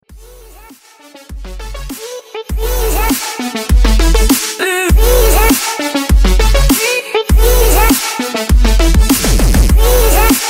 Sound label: Dubstep